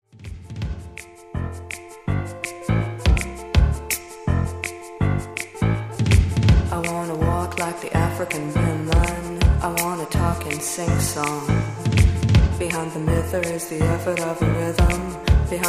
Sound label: rhythm